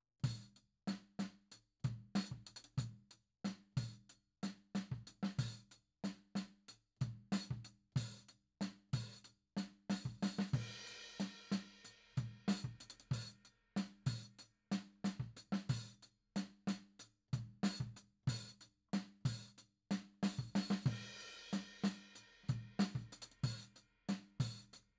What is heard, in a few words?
Tom